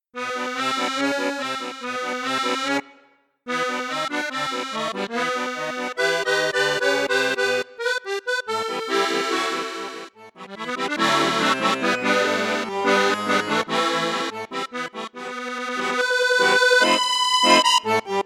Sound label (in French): registre flute juste flute haute, cavagnolo royal musette accordeon